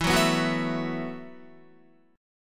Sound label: E Suspended 2nd Suspended 4th E, down, Guitar; Esus2sus4; strummed